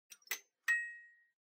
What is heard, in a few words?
Fluorescent Light Noise Crackle